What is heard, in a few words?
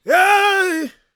GOSP MALE, Vocal Planet Gospel